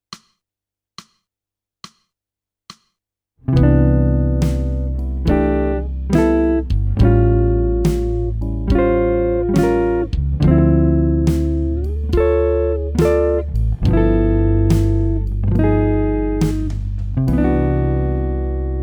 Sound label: jazz